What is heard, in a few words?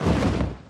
wings; barioth; mh event